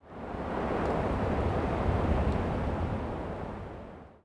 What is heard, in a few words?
wind CALM; The Elder Scrolls III - Morrowind